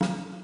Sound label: drum; Dad Beat Dads